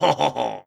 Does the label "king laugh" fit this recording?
Yes